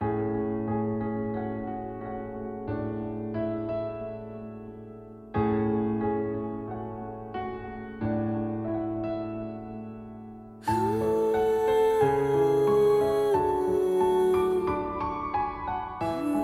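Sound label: background music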